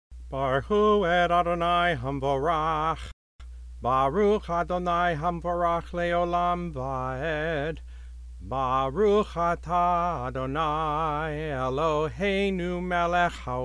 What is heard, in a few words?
voice